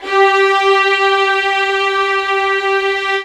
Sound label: LDVLN1 G4, LOUD VIOLINS; Q Up Arts; Denny Jaeger Master Violin Library